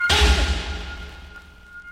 Crash

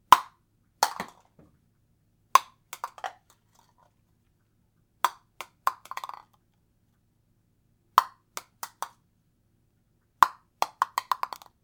Crash cup Falls

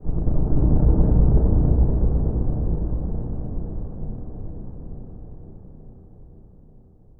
Distant Explosion

